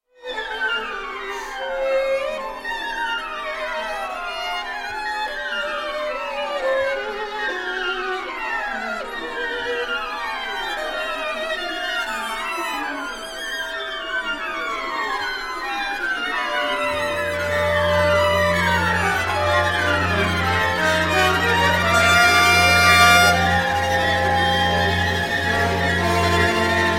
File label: symphony orchestra, violin concerto; BBC Scottish Symphony Orchestra; Ilan Volkov